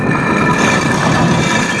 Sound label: stn sld; quake; halo; doors